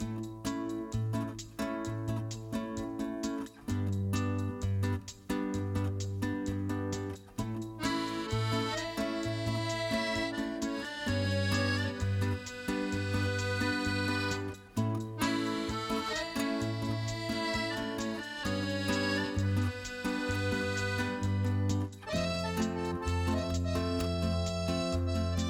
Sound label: BGM Accordion Ver Loop